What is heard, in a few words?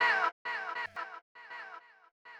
VOX HIT; HipHop Fx; LiveAct Pool 1